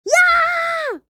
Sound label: Kid yelling playfully